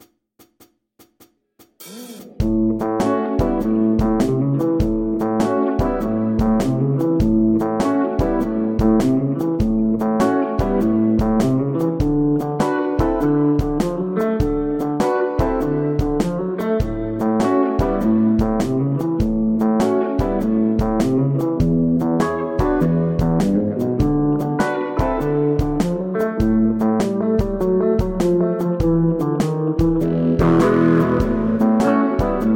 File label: Blues